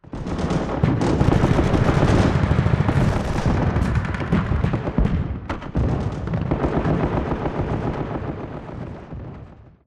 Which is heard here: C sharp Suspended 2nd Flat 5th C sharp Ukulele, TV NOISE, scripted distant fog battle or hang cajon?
scripted distant fog battle